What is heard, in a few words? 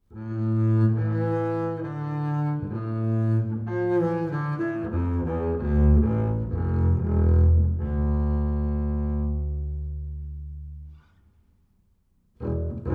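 acoustic bass Bowed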